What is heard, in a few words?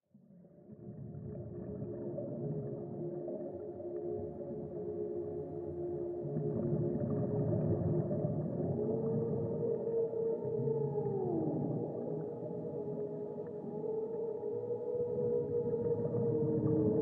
relaxing music healing dolphins songs alpha binaural beats for study meditation